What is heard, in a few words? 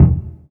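KICK, Drum Machines Akai MPC2500; INTERNAL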